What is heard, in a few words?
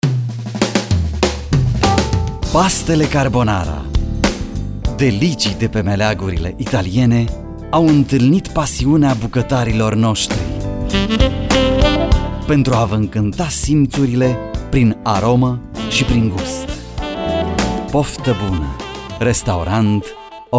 Jingle; Paste Carbonara